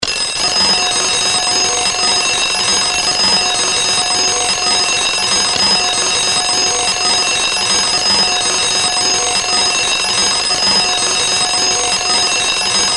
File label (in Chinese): 电铃; 钟声; 广播室铃声系统